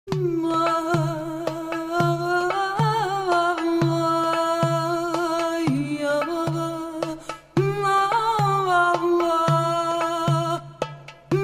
Balkanish Song

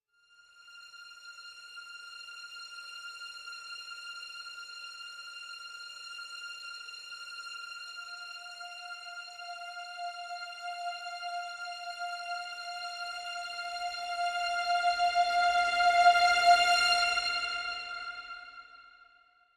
Simple String (F)

